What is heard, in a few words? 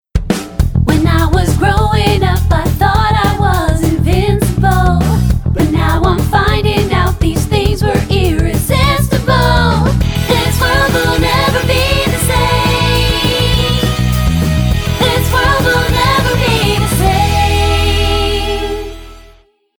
Jingle